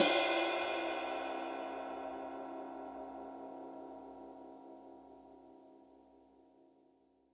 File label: Ride